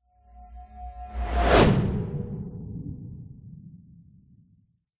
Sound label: science fiction whoosh element pass by machine vehicle ultra fast